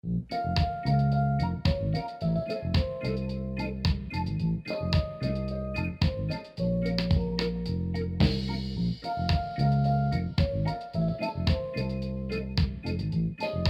Reggae Radio; Luigi's Mansion 3; E.Gadd Selection

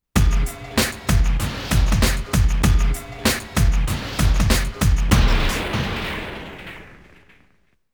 GAME OVER, Game Over BGM

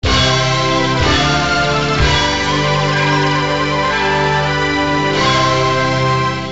Repel, Clear; Wizard Fire; arcade

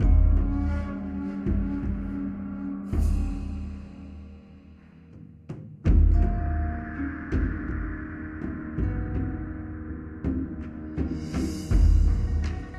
Battle; Age of Wushu; Windows

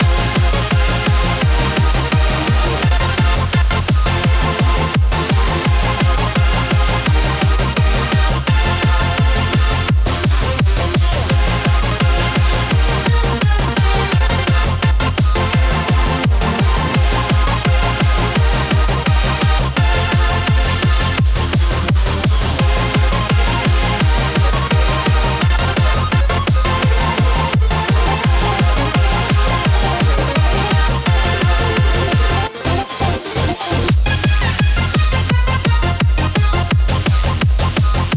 BGM Round; Pac-Man Championship Edition 2 Plus

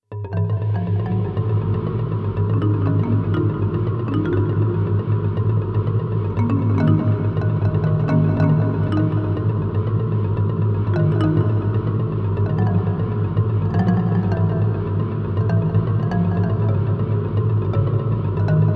Horror; Himawari Pebble in the Sky Portable

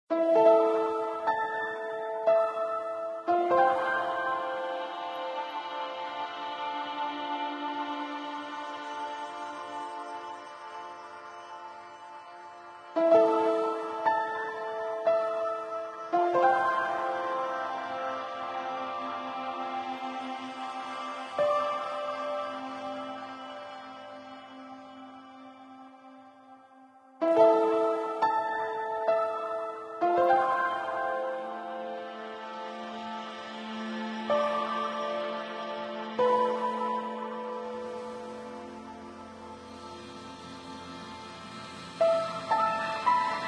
Harbour Sting; ether one redux gamerip 2015

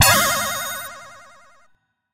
Retry Jingle; Friday Night Funkin' vs. Rewrite OST mod Windows gamerip 2022

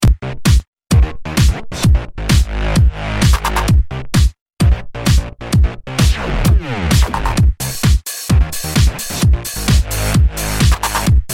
race; Asphalt Injection; ps vita android

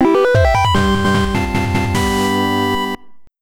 Victory!; Cave Story

